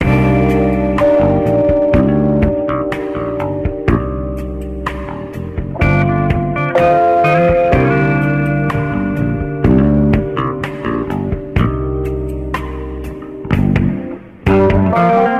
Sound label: guitar